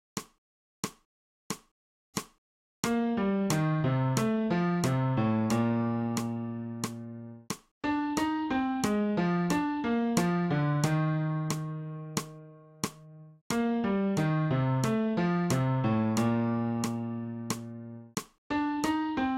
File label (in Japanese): 新フレットレス